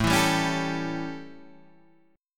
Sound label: A 7th, chord; strummed